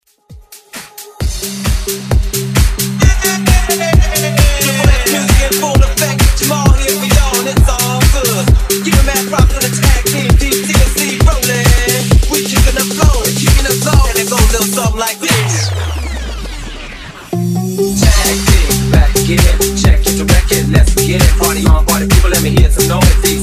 Dance Redrum

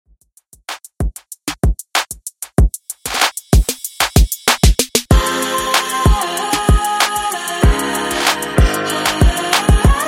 Top40 95 Bpm; Redrum

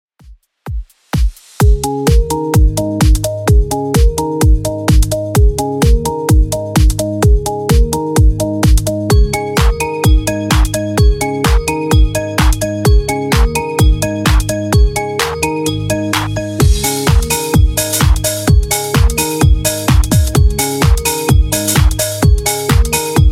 90s Redrum 128bpm

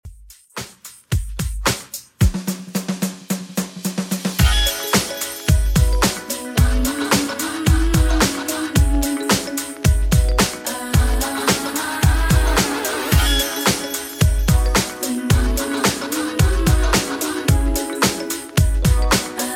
90s Redrum 110bpm CLEAN

